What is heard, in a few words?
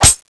hit; Valorant; cstrike